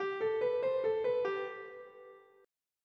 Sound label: Bach C Major Invention